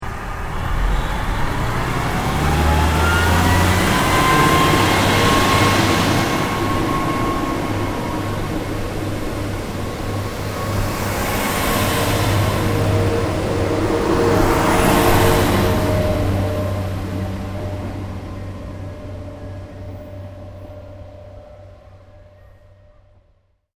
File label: Truck Large Diesal Accellerate Drive Off